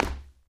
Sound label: hardwood land; Golden Crayon Sounds